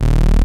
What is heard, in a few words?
Taurus II, Moog Taurus; Greytsounds VOL-1